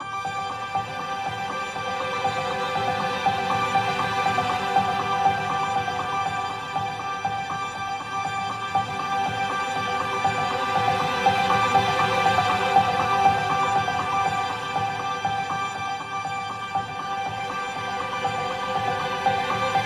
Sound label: Sparkling Ambient